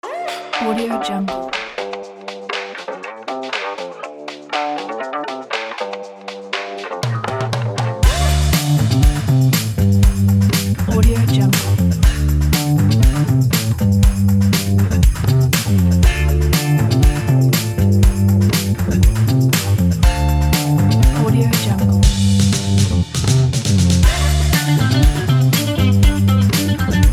funk; intelligentmusic

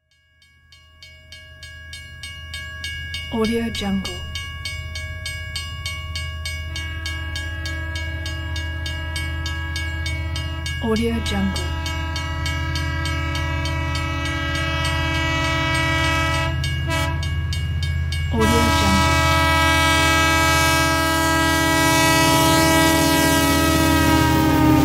train approach

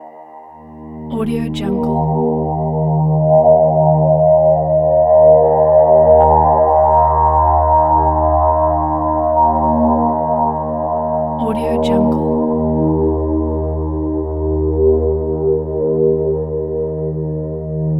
ethnic atmosphere

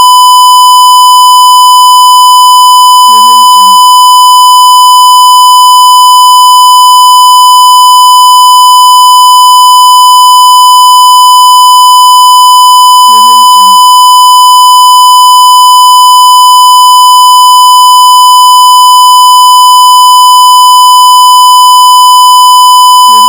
test tone square wave; prosoundfx

